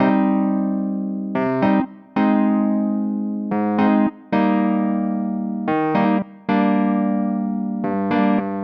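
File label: 111 Pop E-Piano Eb major; Pop Rock; E-PianoClav; Pro Tools Essential Loops; Digidesign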